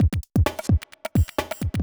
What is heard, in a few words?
electro kit 130